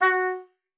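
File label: F#4, oboe d'amore; Xsample VOL-7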